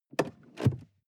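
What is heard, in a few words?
sports car interior seat lock; verkehr transport; autos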